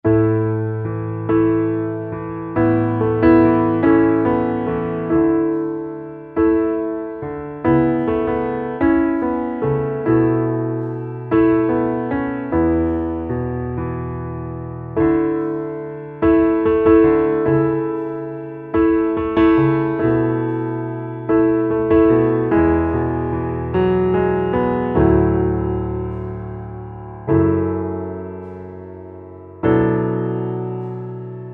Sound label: Underscore PIANO; Worship Flow